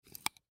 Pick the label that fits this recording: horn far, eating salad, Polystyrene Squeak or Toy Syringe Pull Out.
Toy Syringe Pull Out